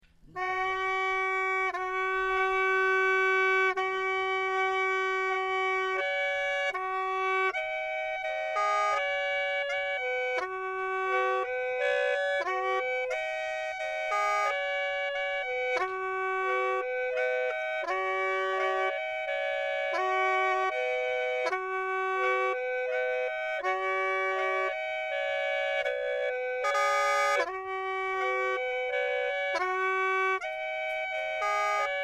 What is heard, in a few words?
red fulu, free reed pipes solo (courting music); Soppong; free reed pipes solo (courting music) this old man refused to stop playing for over 20 min; Lahu